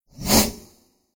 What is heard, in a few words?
Whoosh Transition SciFi Fast; Small Thin Zoom; Scifi Science Fiction; Sound Design; Whooshes